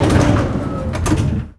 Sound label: lift doors open